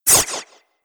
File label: Robotic Speed, sci-fi robot sound effect; Sci-Fi Robot Sfx; Andrew Fleming